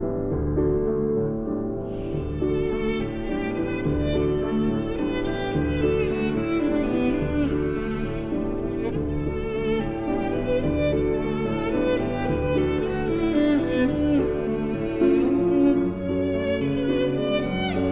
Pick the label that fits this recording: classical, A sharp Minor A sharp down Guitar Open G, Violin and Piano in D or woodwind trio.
Violin and Piano in D